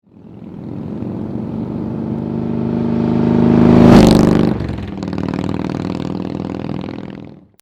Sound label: FLYBY